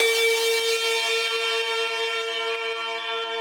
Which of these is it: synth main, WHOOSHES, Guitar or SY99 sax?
synth main